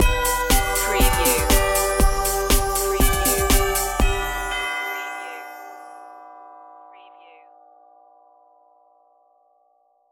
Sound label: auld lang syne synthified outro